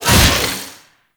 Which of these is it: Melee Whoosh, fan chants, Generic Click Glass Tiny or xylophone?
Melee Whoosh